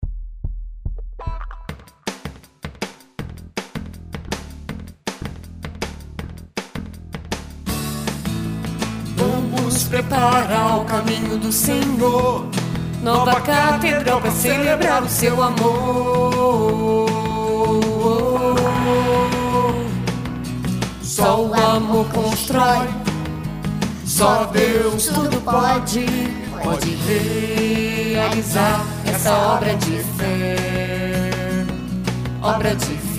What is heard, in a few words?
JINGLE